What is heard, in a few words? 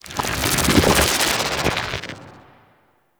Protective Skin; Trinity